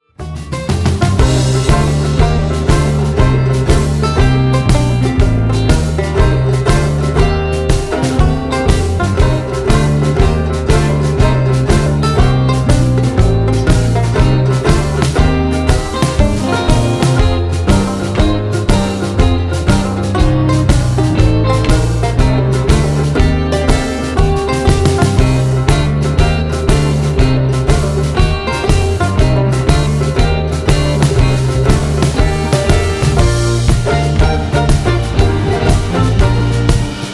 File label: Banjonette